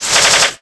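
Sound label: kid attack; morocc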